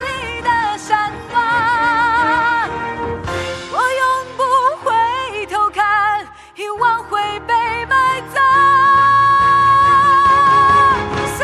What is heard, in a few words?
chinese ringtone